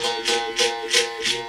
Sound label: LOOP L, Drum & Bass 160 BPM